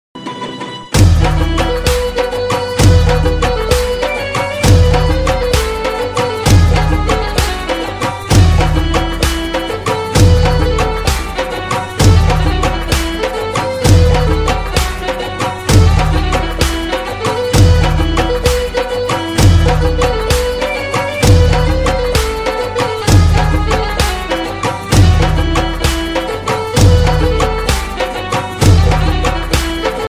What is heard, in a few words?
garba instrument ringtone garba song; avee player template